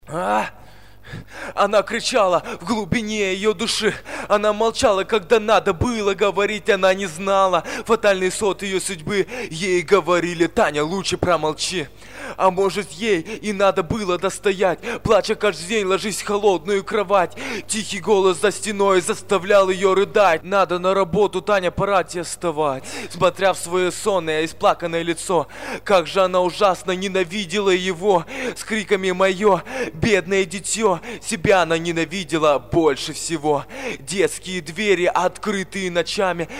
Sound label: acapella